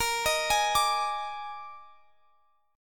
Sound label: D sharp Major 7th Suspended 4th, A sharp